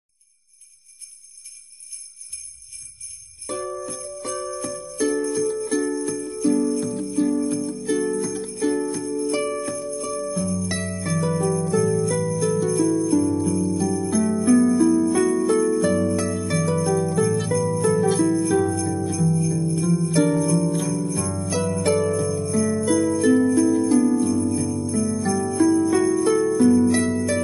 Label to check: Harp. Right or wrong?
Right